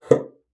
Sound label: BASSOON; Xsample VOL-6